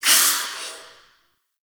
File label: Overwhelm C; Wisp